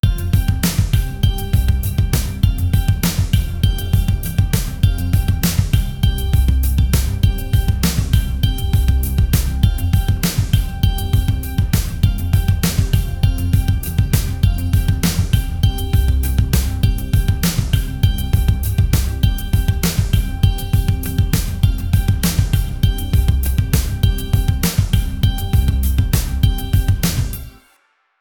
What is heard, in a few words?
financial explaination bg